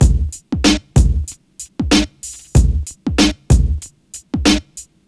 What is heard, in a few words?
Urban Beat, Drums